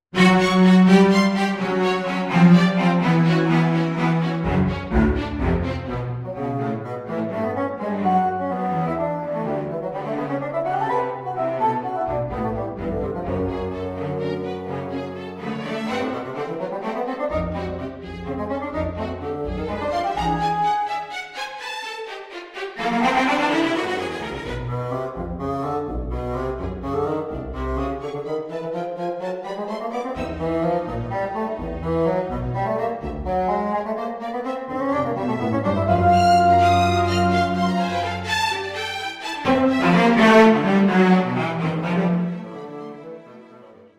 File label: Bassoon Concertino